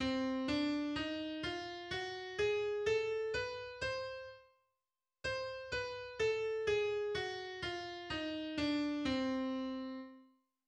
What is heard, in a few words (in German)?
Tonleiter c vermindert